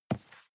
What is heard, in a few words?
cardboard box medium grab slide; objekte